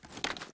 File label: reload fast arm, weapons; gmod; viper